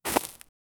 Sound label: ground